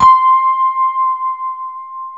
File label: E-RHODES